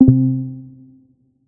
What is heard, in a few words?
sectionfail; osu